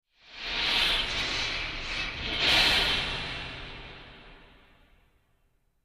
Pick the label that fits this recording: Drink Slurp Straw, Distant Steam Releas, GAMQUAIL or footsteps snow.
Distant Steam Releas